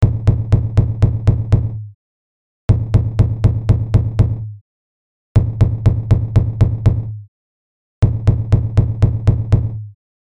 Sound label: Drum Return